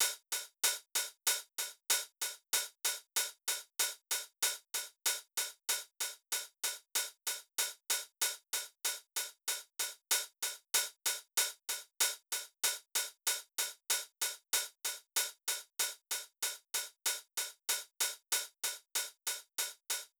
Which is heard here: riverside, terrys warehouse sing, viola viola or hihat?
hihat